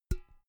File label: empty can damped hit